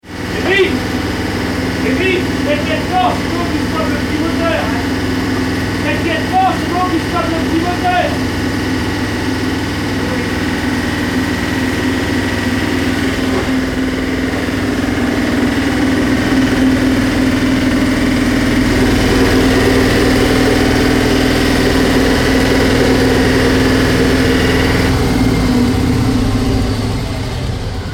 moteur; valparasio; lesconil

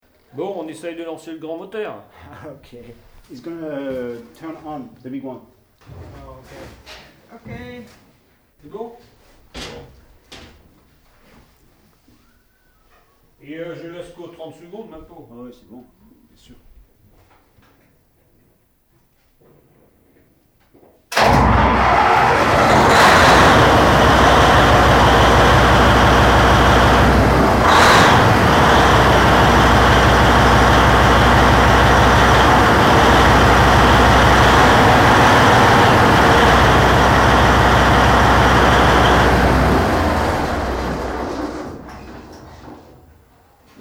valparaiso grand moteur; lesconil

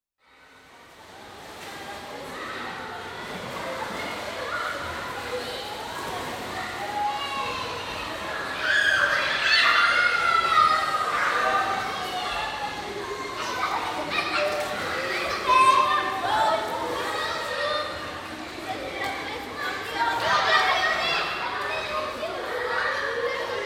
En longeant le bassin; Metabole

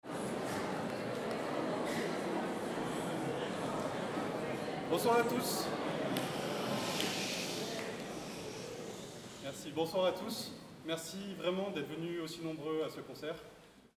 coupure phonotonie concert

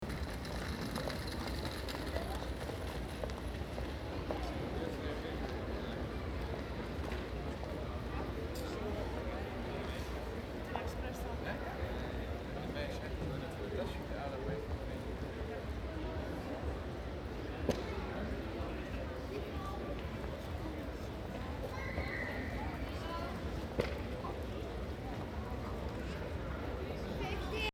rue pietonnes a almere

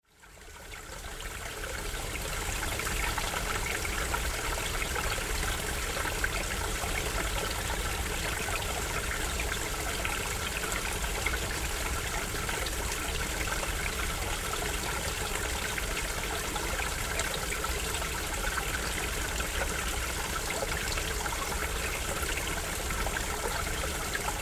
fontaine voie; cartophonie

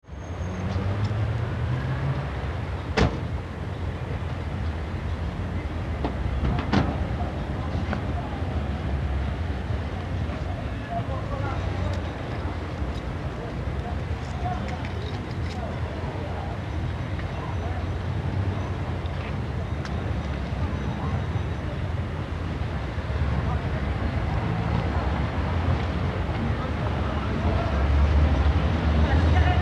arts de la rue samedi soir

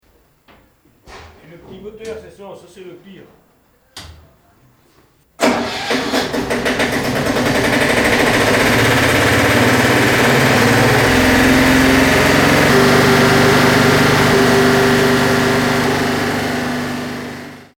petit moteur; valparaiso; lesconil

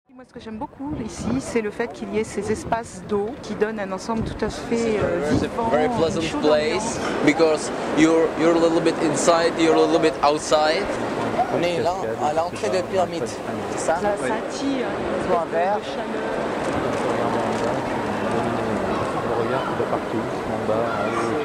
Souterrains Louvres Traversee polyglotte

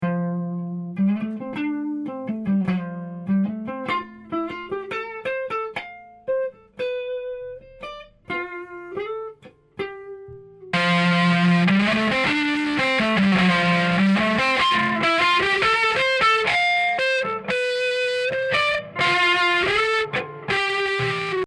FX distortion guitare

